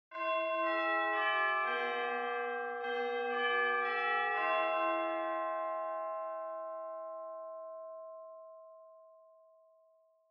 Japanese School Bell Far Fast Mid

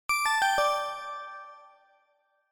Chime Announce

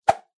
Motion Swish

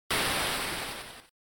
NES General Noise Single

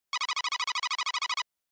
Fighting Message

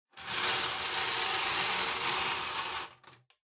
Bingo Machine Spin

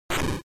Shooter Damage

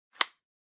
Cell Phone Hardware Open

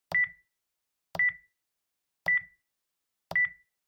Telephone Hardware Button

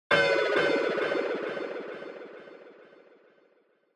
Negative Delay; Onoma

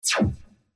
Cyber

